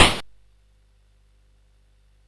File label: Dark Castle, CSG8580R5